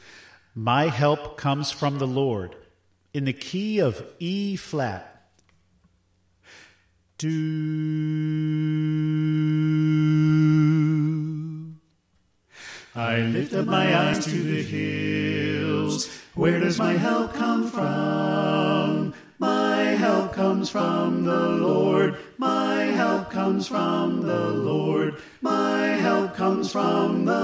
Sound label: Tenor Left